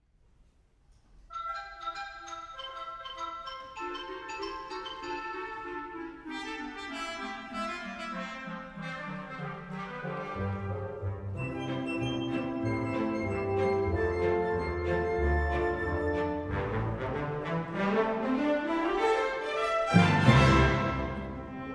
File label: orchestra